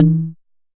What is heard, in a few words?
Jomox MBrane, drum machines; Jomox MBrane 11